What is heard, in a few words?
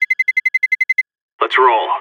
Interface Success